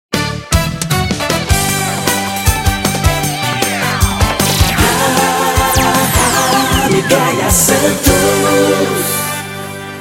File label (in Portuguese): trilha